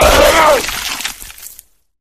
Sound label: flesh destroyed large; flood